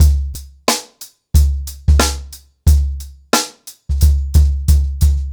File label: Ripple Effect 90BPM, acoustic drums